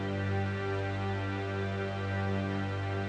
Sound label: Quest Complete Drone looped